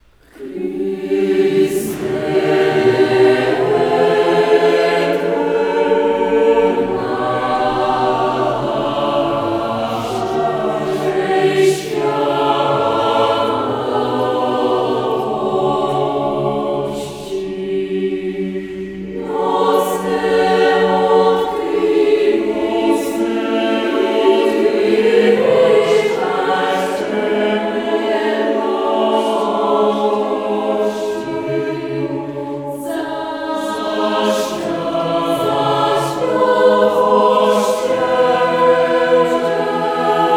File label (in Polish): Kryste dniu naszej światłości Canto; Włocławek